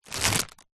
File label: foley packet plastic crisps multipack empty set down surface